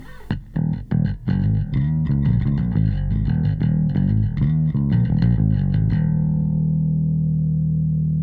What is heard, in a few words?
bass